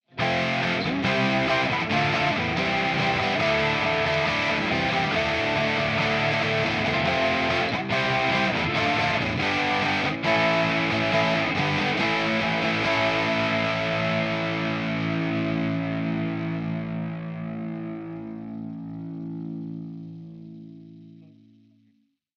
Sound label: ts999 srlp chords modern, ampsims; ignite